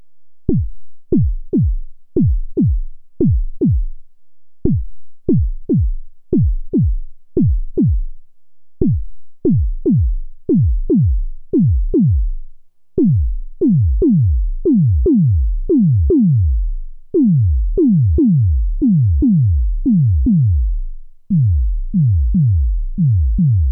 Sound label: kicks, modular; modular demos